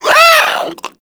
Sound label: Infested Runner Hear Sound; Warframe; Runners